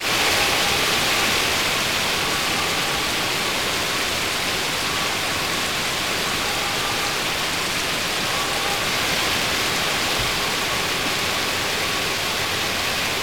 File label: storm rain; best service Blue Box